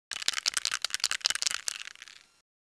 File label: Shake spray